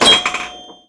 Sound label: pinball; EarWax; Just for Fun